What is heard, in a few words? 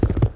Horse Run; Civ4 beyond sword